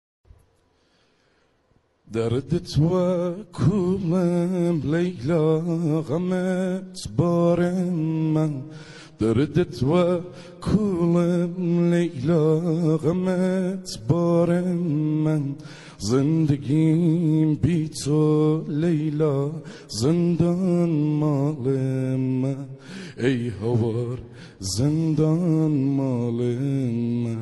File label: kordi ba gitar; kordi ghmgin